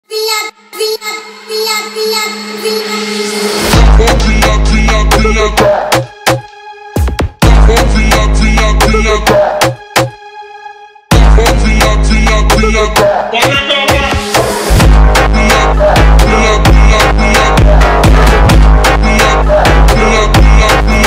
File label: brazilian funk montagem; fonk